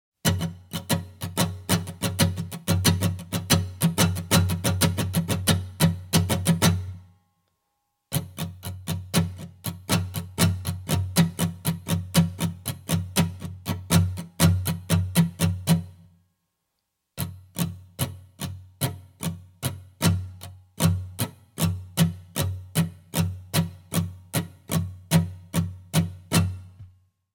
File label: Muted Strumming Drill